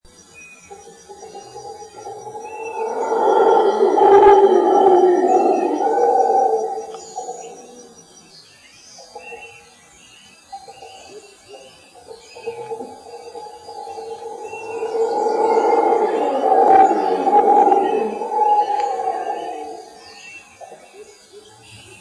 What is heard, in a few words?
howler monkey sound, Costa Rica